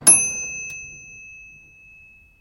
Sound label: microwave ping